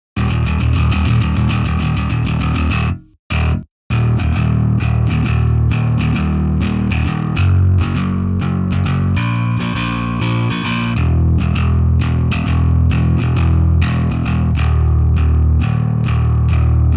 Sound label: Distorted